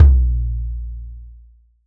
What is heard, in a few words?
Tom Marching, Drums; One Shots